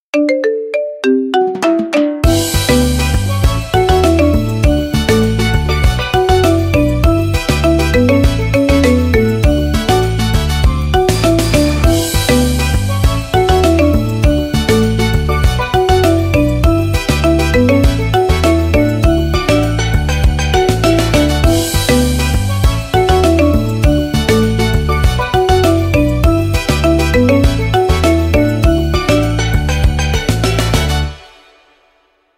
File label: Marimba; Dr Tony Chopper